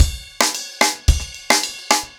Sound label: Crash 110BPM, ACOUSTIC DRUMS; Sonokinetic - The Roots Drumloops